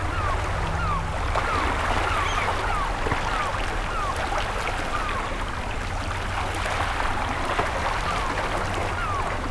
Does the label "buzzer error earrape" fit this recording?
No